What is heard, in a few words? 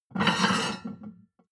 plate on plate take slide medium